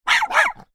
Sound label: dogs x2 barking small; Animals; Dogs Barking (2X Small); McKinney Sound